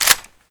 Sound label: bolt back